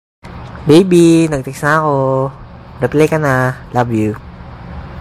Hehe

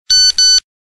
Two Beep

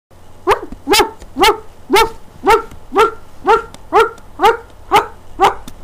Dog Bark

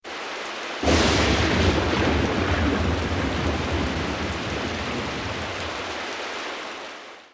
Rain thunder

